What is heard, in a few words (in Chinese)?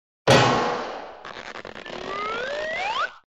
被击中转起来; 综艺类音效